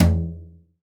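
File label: TBLT MED, 30S BRUSH KIT; Northstar - New Gold VOL-2; 30S BRUSH KT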